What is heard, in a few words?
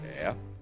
e buzz